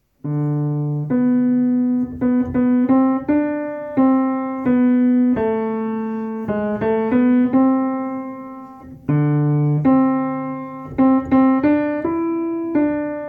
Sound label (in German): Tenor; Bajazzo